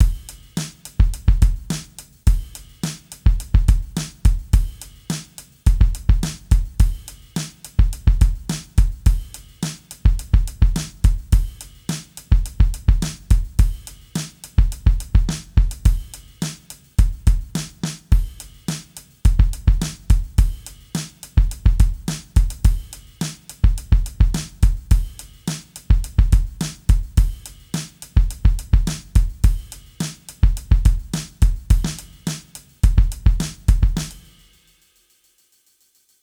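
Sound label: Chicken Drums 106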